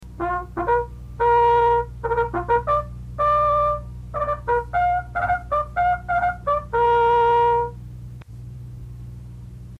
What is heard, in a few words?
Watertight Doors, Bugle calls